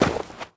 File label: snow, Players